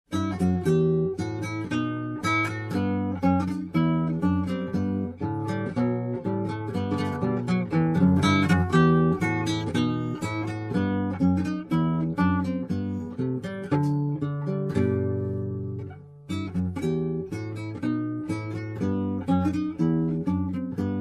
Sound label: Bourree; Bach